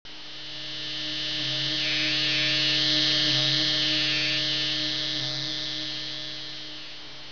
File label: BUZZ